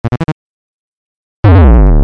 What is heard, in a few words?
attack